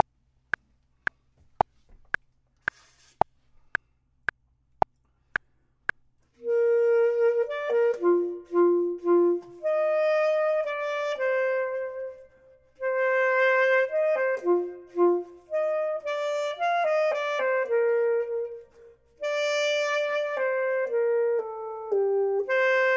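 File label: top, Saxophone and Clarinet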